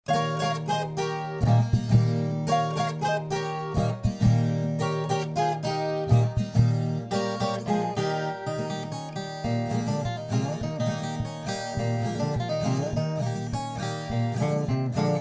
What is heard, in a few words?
Viola